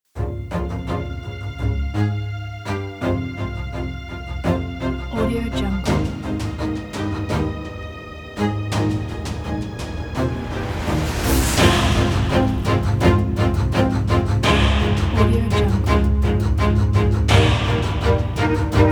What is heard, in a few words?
buildup to glory